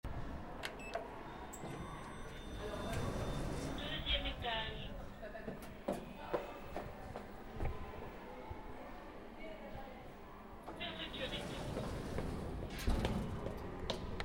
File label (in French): BRUIT ASCENSEUR PAS